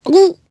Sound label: ehgulp